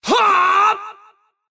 Mob Voice Bad Brawler Skill

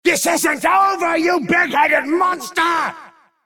Mob Voice Die; Mad Mage

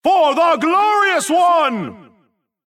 MobVoice Black Knight Regen

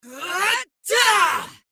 Mob Voice Attack; Bad Brawler

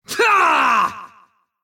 Mob Voice Vicious Hunter Attack

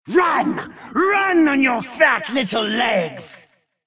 Mob Voice Mad Mage Regen

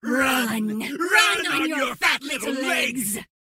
Mob Voice Mad Mage Regen

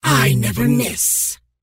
Attack, hunter; Vicious Hunter; Mob Voice

